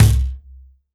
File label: MIXED DRUMS, World Instruments